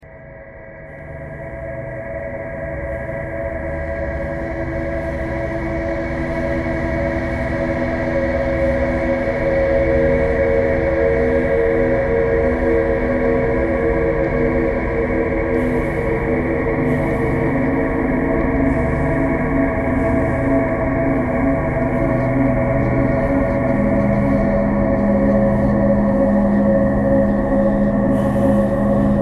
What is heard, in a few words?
traffic core birds thread pentatonic